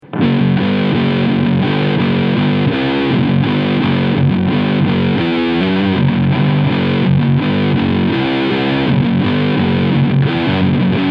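Schecter C 8 Multiscale Silver Mountain Distortion Steg Humbucker Riff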